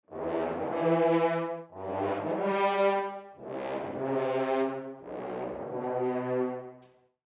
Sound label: Brass 6 Fhs Rip